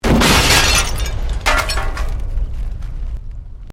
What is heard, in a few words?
Explosion Medium Metal Glass